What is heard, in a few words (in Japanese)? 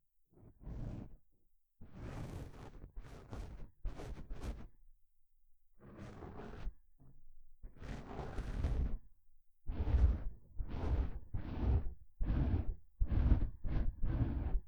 耳かき, ASMR; とある日の耳かき